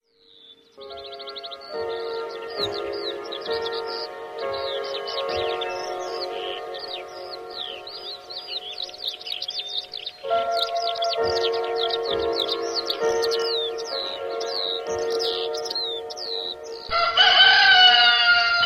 wakeup alarmi

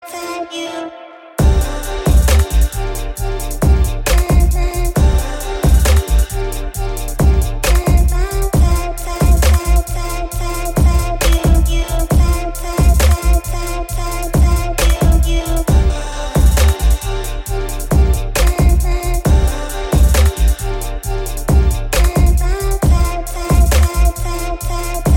iPhone Chill Trap; suonerie

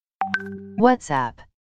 whatsapp sounds

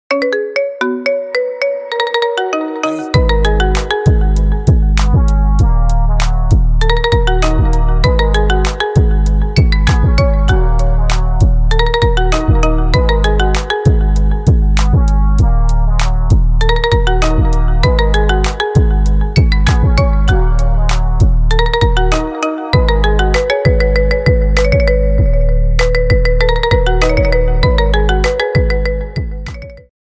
Zeze Marimba; suonerie

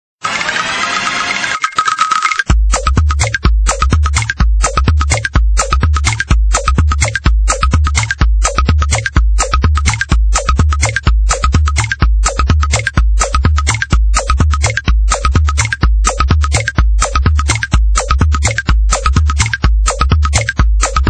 Chatterbox Techno; suonerie

